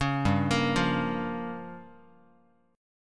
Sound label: G Minor 7th Flat 5th, Guitar; Gm7b5 arpeggiated; JGuitar